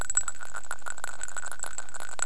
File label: cat